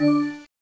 Contact Alert; LG MU550; MSN; IMTone

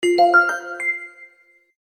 Crystal, notifications

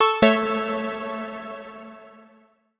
Orange Alert, notifications; Telstra

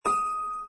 Cloud Off, phonetones, LG A250 system

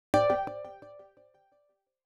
Bloom, notifications; Motorola Moto-G20; lenovo

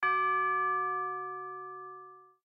Single Doorbell; Samsung; Galaxy A56; Calm

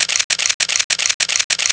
camera shutter basic 3 shot; Samsung SGH-i320; Camera Sounds

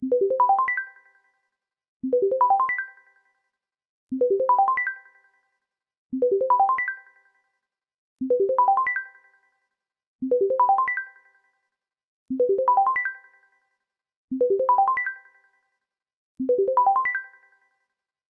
Bubble, ringtones; LG G3 A

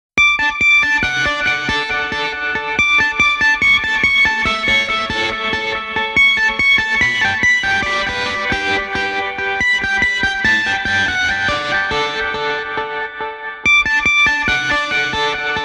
Ring Anthem Guitar; Microsoft; Windows Mobile ringtones; Individual download-only (2008)

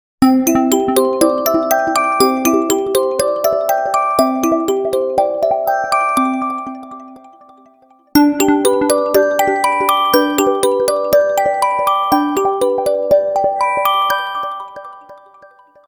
echo

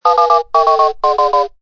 Sad Clown (Battery Low); Danger Hiptop-Slide

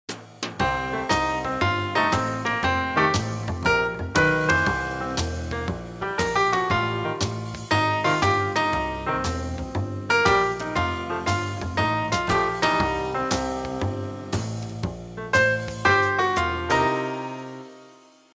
Velvet blues, ringtones

